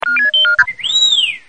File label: R2D2; sms